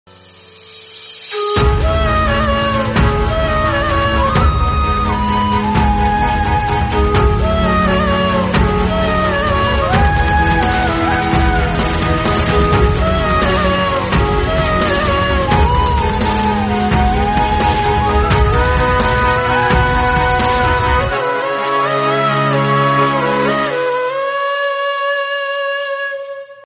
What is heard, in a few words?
Instrumental Bgm